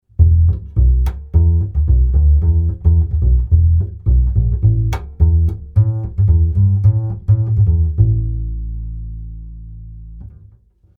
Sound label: Upright Bass Waves CLA3A; Red 4.27; Gain 4.72